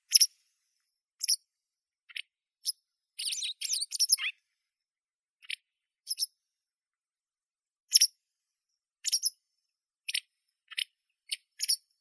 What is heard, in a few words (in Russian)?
tryasoguzka belaya motacilla alba, zvuki prirodi